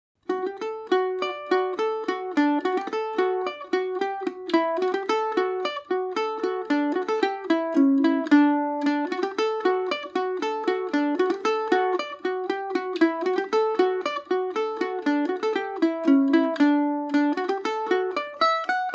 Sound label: bottomless well dmaj, mandolin; Paris Swing Macaferri mandolin